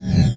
Multimedia Internet CD-Rom Flash Whoosh Short

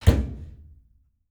Washing Machine Lid Closing, Household

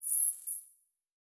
Digital; Multimedia Internet CD-Rom Flash

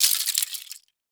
Crash Debris Plastic Dropping On Cement; Crashes

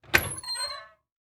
Household Pantry Open Door Squeaky

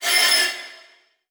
Transition Metallic Hit; Production Element

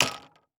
Sports Club Golf Club Dropping Into Golf Bag

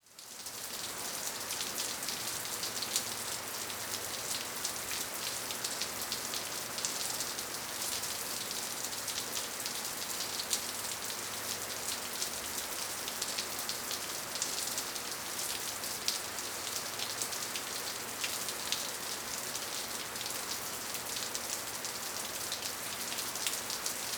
Weather Ambience Rain Drips Water; AdobeCC

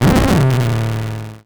Transition Feedback Distorted; Adobe; Production Element

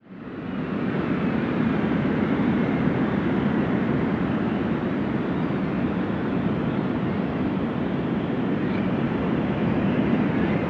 Weather Ambience Wind Blizzard Snow Whistle Gust

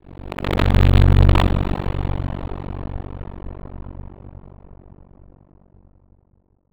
Title Transition Distortion

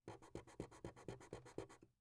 Household Pencil Eraser Erasing On Paper Short

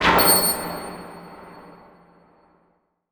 Title Transition Burst; Adobe; Production Element

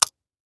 Industry Tool Wire Cutters Cut Wire

